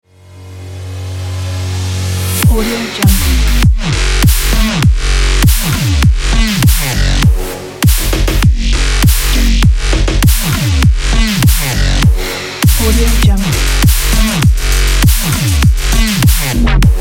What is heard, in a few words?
dubstep electro